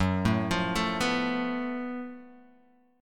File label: Gb Minor Major 7th Double Flat 5th, Guitar, Half Step Down, chord